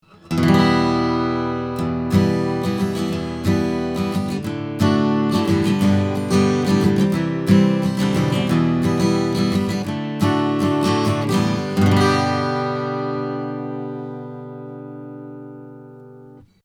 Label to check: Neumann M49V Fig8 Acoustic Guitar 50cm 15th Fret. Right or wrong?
Right